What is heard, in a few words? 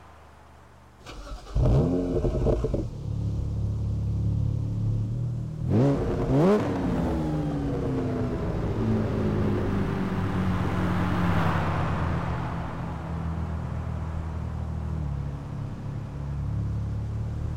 bmw 1m coupe; 2011; BMW 1M [E82]; BMW 125i [F21]